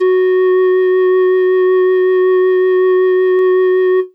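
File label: Pan Flute DX100 F#3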